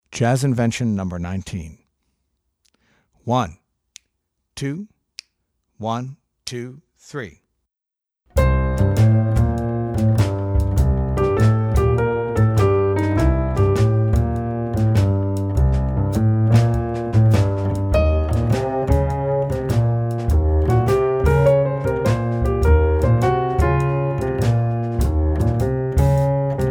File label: Jazz Invention, piano; Jazz Inventions for Keyboard